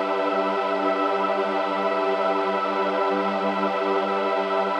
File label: Pad Loop G, shimmer and sparkle; Pad Loops